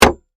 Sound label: Hammer Hit Wood, Industry, Hammer, Hit, Wood, Hard, Impact; Jacks (Sound Effects:Machines); BlastWave